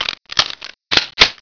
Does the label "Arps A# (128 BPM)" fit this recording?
No